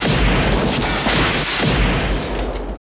SHOT SEQ; Total Control - Action Cutz

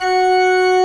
CHURCH OR F#4 L; Best Service; Advanced Media Trax Modern Composer

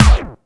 Analogue Scratch, Kick Drums; Zero-G; Soundclash

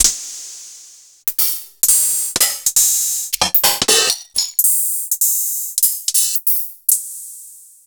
Quasimidi Rave-o-lution 309 dry hihats

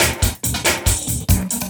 LOOP SD, 140 BPM; Ueberschall; Drum & Bass Resonance

